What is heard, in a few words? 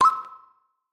Signal Good; MiSide